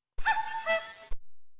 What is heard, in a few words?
Cookushka